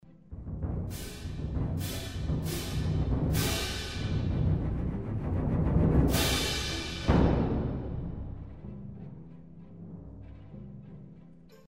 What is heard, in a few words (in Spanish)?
bombo platillos